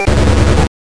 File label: Tumbling Down; Dig Dug II; arcade